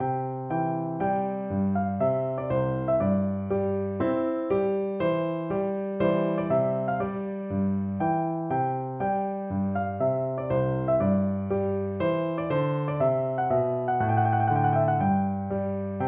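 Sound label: trumpet tune piano; clarke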